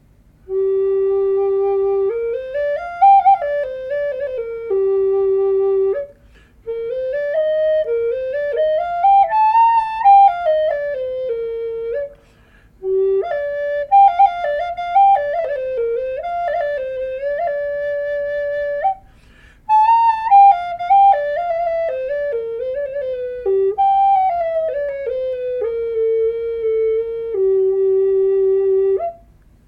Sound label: G4, flutes